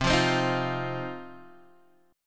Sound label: C Minor Double Flat 5th C down Guitar Open D